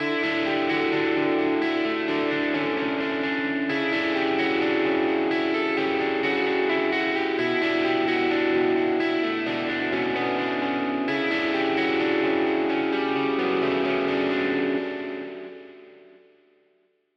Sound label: STEREO GUITAR GUITAR ONLY FULL